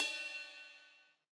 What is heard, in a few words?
RX8 Cup, Yamaha RX-8